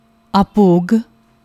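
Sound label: abhog, singing styles of Gurmat Sangeet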